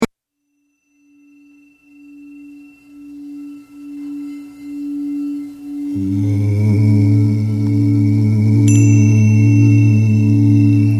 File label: Crown Chakra Sound Meditation